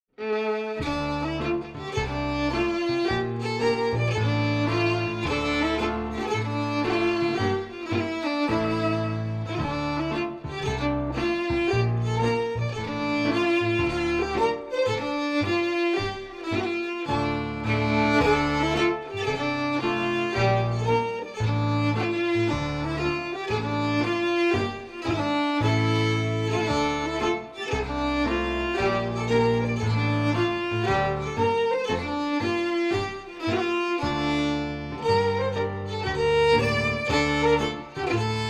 FIDDLE PIANO; Jink & Diddle Book o' Tunes 2021

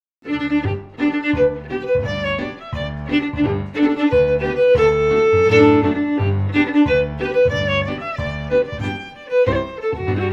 FIDDLE PIANO; Jink & Diddle Book o' Tunes 2021

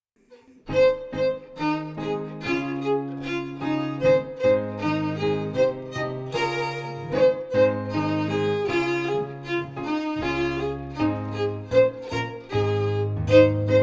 FIDDLE PIANO; Jink & Diddle Book o' Tunes 2021

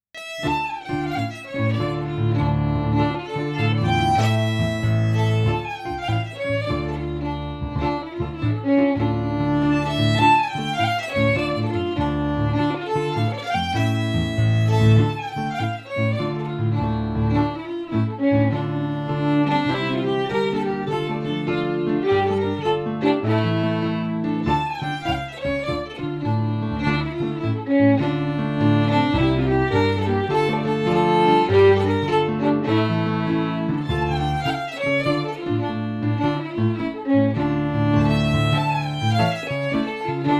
FIDDLE PIANO; Jink & Diddle Book o' Tunes 2021

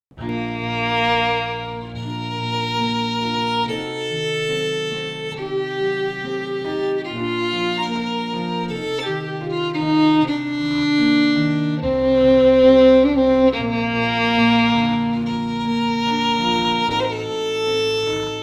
FIDDLE PIANO; Jink & Diddle Book o' Tunes 2021

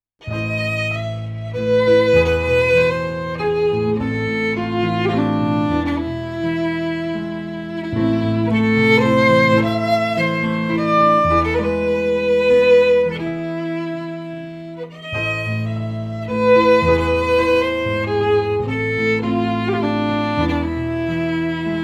FIDDLE PIANO; Jink & Diddle Book o' Tunes 2021

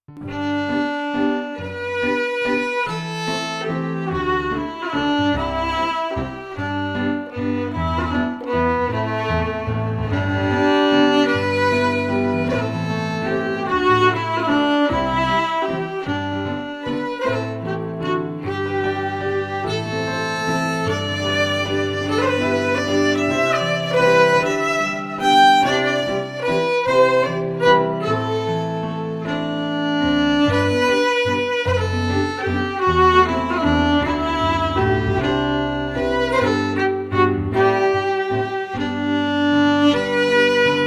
FIDDLE PIANO; Jink & Diddle Book o' Tunes 2021

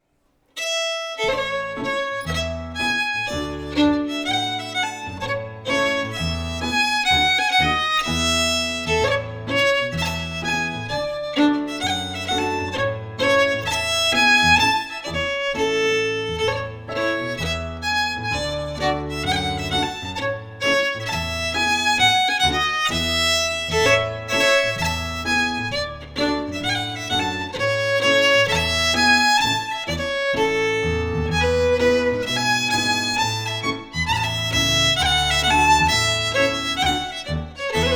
Highland Fling FIDDLE PIANO; Jink & Diddle Book o' Tunes 2021

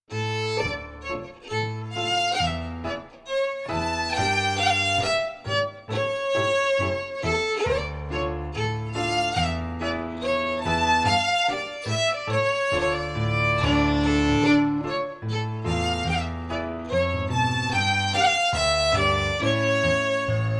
FIDDLE PIANO; Jink & Diddle Book o' Tunes 2021

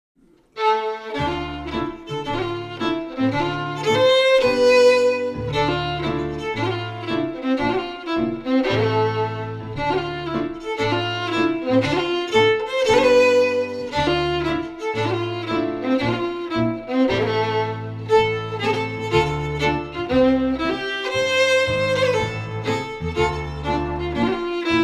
FIDDLE PIANO; Jink & Diddle Book o' Tunes 2021

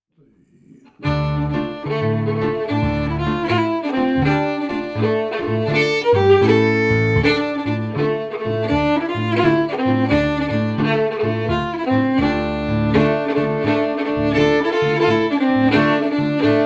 FIDDLE PIANO; Jink & Diddle Book o' Tunes 2021

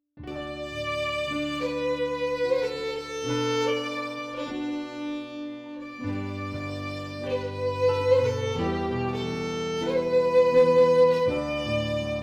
FIDDLE PIANO; Jink & Diddle Book o' Tunes 2021

